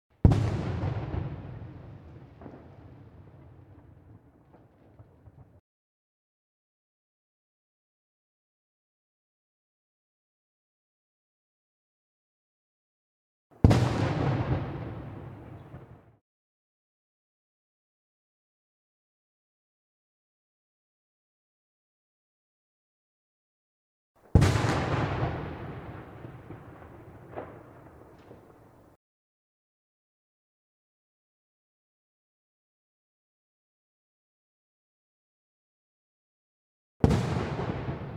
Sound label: mortar shelling